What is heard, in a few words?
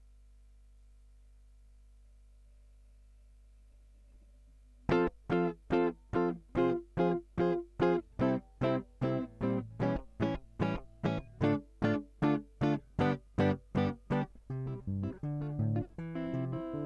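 Guitar Line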